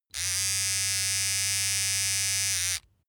razor small

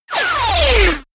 wind down

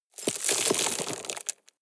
drop rock debris medium short

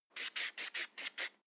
marker cancel

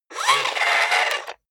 robot movement medium

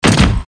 heavy metallic step

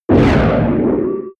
whoosh distorted swish hissing

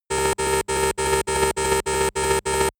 control room alarm

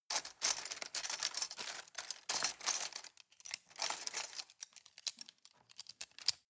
cutlery plastic multiple drawer rattle search

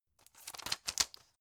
plastic blister handle

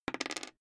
die roll table

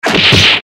eastern fist hit seq part1

